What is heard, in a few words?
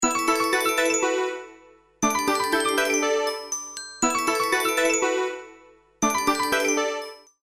Ring Tone; Sony Ericsson; P900